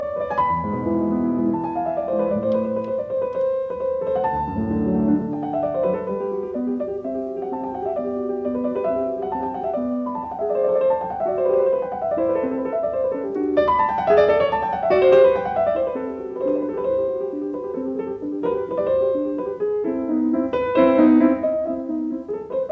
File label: Piano Sonata No 1 in C major I Allegro; Lili Kraus